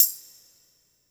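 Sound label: tambourine wet; InVision Interactive; Percussion Slam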